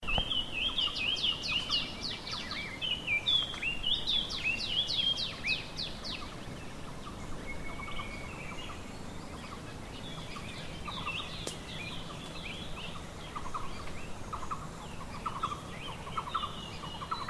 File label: Spot throated Babbler, vietnam birds